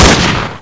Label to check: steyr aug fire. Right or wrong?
Right